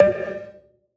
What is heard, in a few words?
Perc; Sample Banks; Percussion